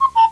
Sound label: CUCKOO; WAV Sound Effects; houshold; clokphon